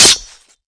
sword hit wall

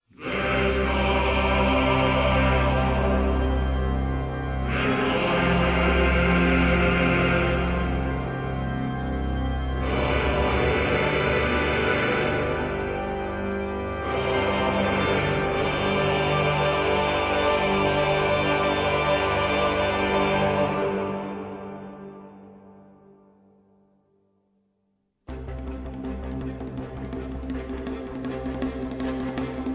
win zombie

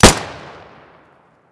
p228; cstrike; ZP CRISTIAN; ZPB WEAPONS

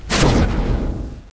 swap

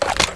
rl magout; cstrike

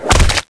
tentacles hit

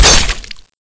acid trap knife stab

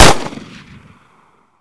sound survivor sg552; cstrike; ZP CRISTIAN

